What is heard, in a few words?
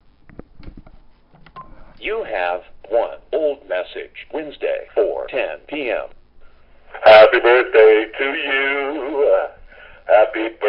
birthday song